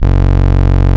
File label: VOX F#1, Vintage Keyboards; Big Fish Audio; First Call Vintage Keyboards